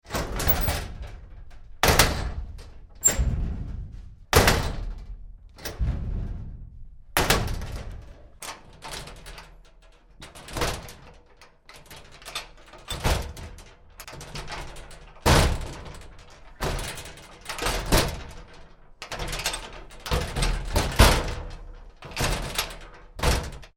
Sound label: Locker doors Metal, Various locker doors open; Metal Doors (Sound Effects:Doors)